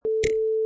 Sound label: Click